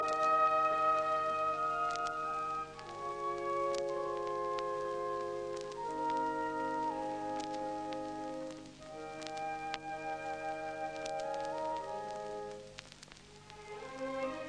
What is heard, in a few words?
Symphony in C minor, Andante con moto; Beethoven; No 5; Op 67